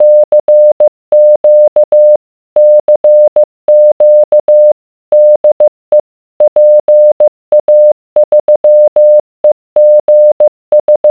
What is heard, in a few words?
Morse code